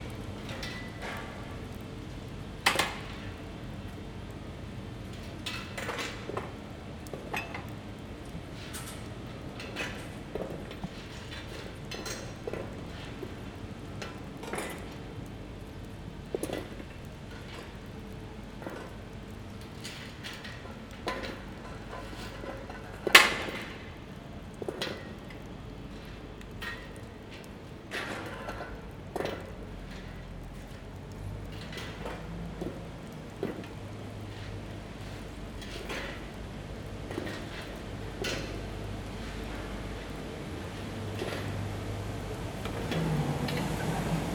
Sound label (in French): Nantes - Matin - Camion Nettoyage - mise en place des chaises de terass; terasse bar; Ville; ZoomH2N